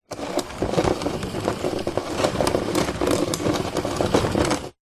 household vacuum cleaner stick dyson off roll head on hard floor